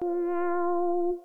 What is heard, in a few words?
chip synth